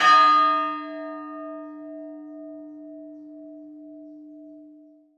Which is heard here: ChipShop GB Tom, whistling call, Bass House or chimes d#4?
chimes d#4